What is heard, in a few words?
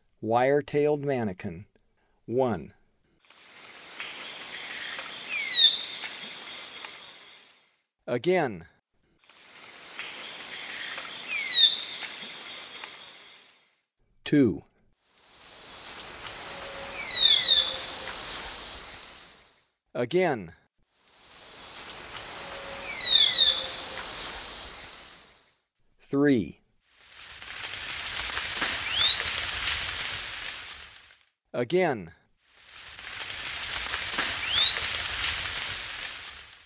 Wire-tailed Manakin; S. America; Eastern Lowlands